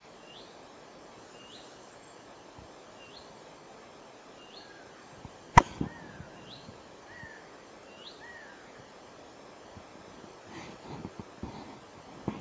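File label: wail, honey buzzard; hexhamshire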